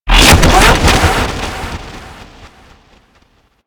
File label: Space Portal Turn On; Warframe; Ambience; Space Battles